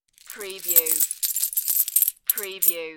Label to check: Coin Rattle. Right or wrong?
Right